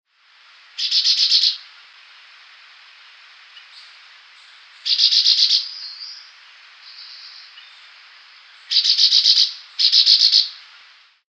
tanga alcalde, Euphonia pectoralis, Chestnut-bellied Euphonia; Tangará Alcalde

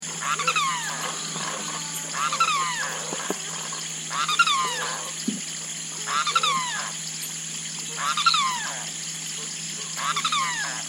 carau; cantos

